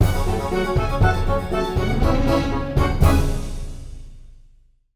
News transition

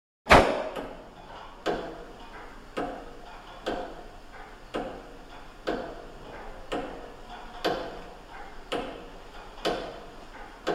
Large Reverberant Room; Hollywood Edge Historical Series; Traffic Free BG's & FX

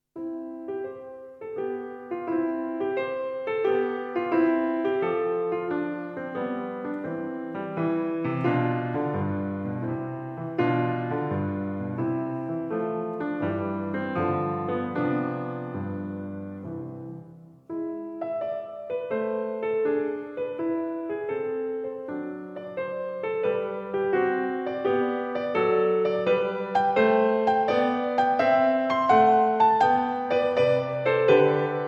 Sonatina, Sonatinas For Piano

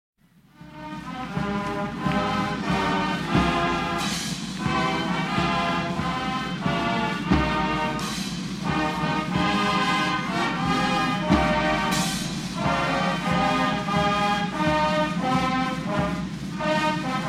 National Anthem; Sony Music Special; The Complete Cbs Audiofile

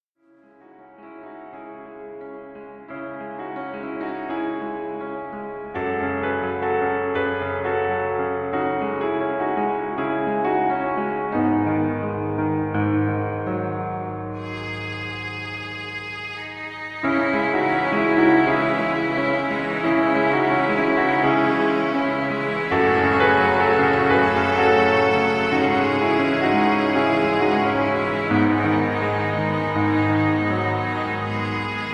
Tides (instrumental)

